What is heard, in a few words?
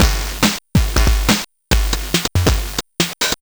GB Drums, 140 BPM; loops; CHIPSHOP DELUXE